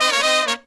132, Pop & Funk Brass; Best Service ProSamples vol.25; AKAI

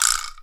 Vibraslap; 300 Drum Machines; E-MU MoPhatt

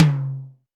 Tom 10 inch Stark, Alesis D4 drum machine

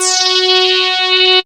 SWEEP F#3; Best Service Alpha Dance vol.2

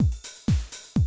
125, Classic Drum Loops

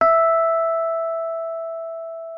MK1 E5, RHODES; Xsample VOL-1

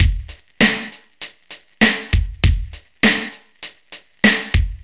Grungy at 100bpm, Drums Beats

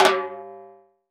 Timbal Hi Flm, Roland BK-5; Percussion

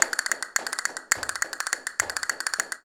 CASTANETS, Flamenco; Best Service ProSamples vol.46; AKAI

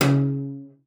CELLO FINGER C#3; Xsample VOL-5

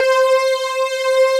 SYN STRGS, JV 1080; Pro-Rec - Synthsonic

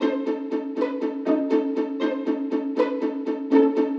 Strings (120BPM); musicradar

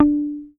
HOFNER MUTE, Bass

